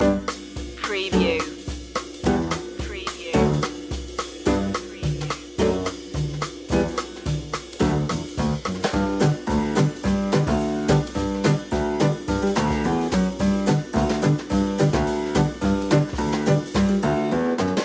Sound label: shimmy